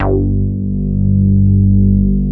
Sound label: MOOG BASS G2; Best Service Dream Experience